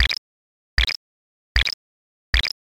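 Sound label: footsteps grass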